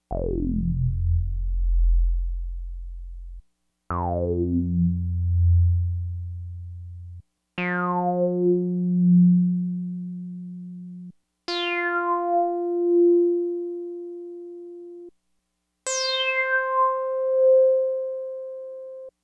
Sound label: CEM LP sweep